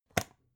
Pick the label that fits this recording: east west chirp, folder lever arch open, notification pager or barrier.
folder lever arch open